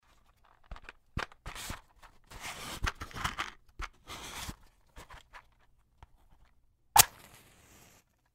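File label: spichki, matches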